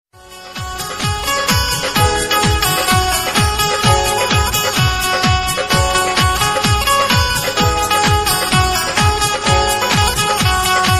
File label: banjo ringtone, benjo dhun